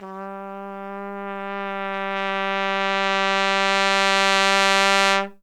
CRES. TRUMPET, Pop & Funk Brass; Best Service ProSamples vol.25; AKAI

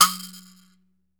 Dance Drums; Best Service ProSamples vol.15; AKAI

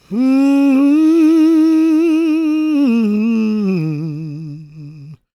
GOSP MALE, SOLO

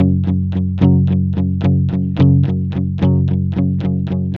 ROCK, Vintage Blues Guitar; USB Soundscan vol.22; AKAI

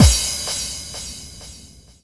BD-FX, Dance Mega Drums; Best Service

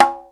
conga slp, Yamaha TG-500

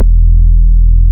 BAS Mini; Best Service Gigapack 2

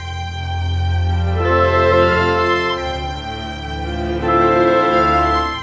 Orchestra, Rock Pop; Sonic Foundry (Sony Creative Software); Orchestral Series VOL-4; Rock and Pop

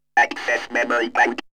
Vocal; ZERO G - Datafile DISC 1

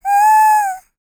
LICKS, Vocal Planet Jazz FX; Spectrasonics

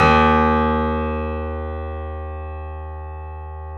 3D Pianos; E-MU Producer Series Vol. 5; 3-D Audio Collection; Boes

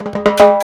Mozambique, 120, African Contemporary; Spectrasonics; Supreme Beats

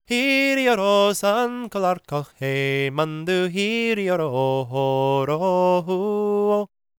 GAELIC, World Voices; ILIO; Vocal Planet

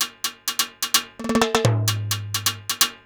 TMBAL A DE; CUBA

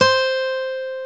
GTR, Dual Guitar; Club-50 - Foundations; Paul

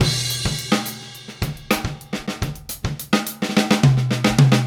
Straight Kit, World Pop Beats

